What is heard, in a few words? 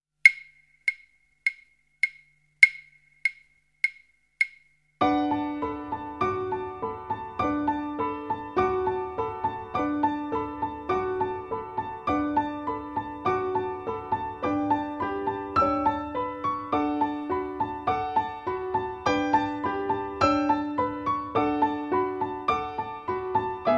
backing track; Violín; Goldfish bowl